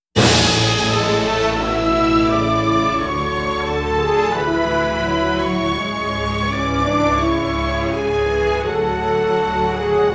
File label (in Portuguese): impacto